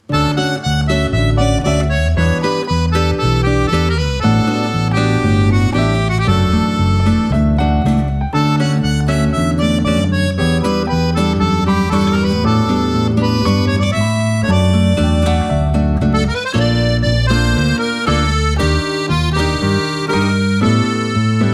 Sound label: Tango